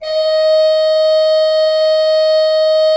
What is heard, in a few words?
D4, Cathedral Organ, HOLZGEDAKT; Propeller Island